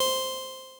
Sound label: Start